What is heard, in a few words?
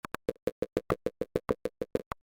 boom